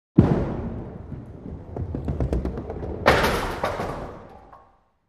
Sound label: Bowling Ball Rolls